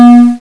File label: clank